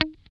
GUI D4#, E-Gui Muted; Sound & Vision; Gigapack I CD 2

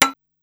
Thonk, Korg Wavestation-SR Drum Kit; Wave 455 - Drum Kit

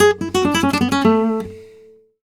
Flamenco Guitar Picado, a

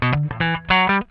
SWING IT, Funk Guitar; Zero G - Funk Guitar

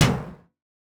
NonLin Tom; RoBKTA Sample Pack Supreme

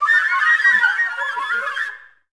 FL FX, Advanced Orchestra; Best Service

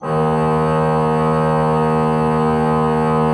D2, Cathedral Organ; ILIO; Propeller Islands

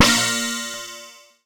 BELL-GONG, A#; Big Fish Audio

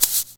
Perc, Alesis DM5; Drums

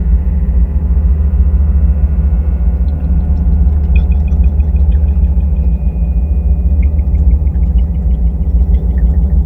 ambience

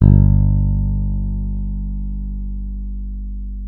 G1, FINGER MUTE; Xsample VOL-1

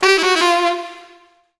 Sax Funky; Drum N Bass; Misc; Sonic Foundry (Sony Creative Software); Knutrix Drum & Bass

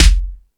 Discoball, drum machine

